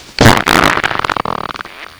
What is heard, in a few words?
fart